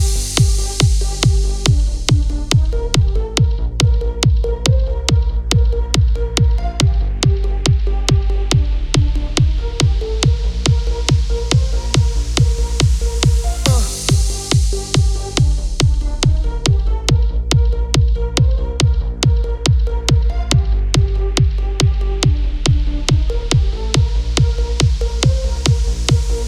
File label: Walking Music Calisthenics Music Remix